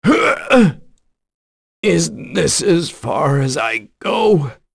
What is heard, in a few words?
Vox Dead; kings raid; voices heroes en